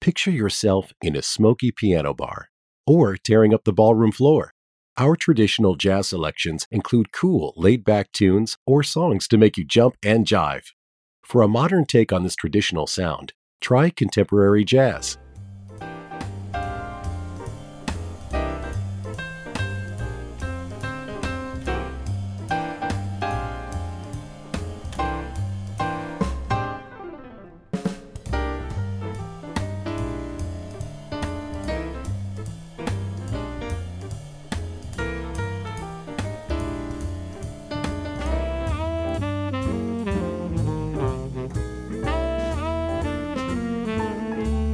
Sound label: Traditional Jazz, music on hold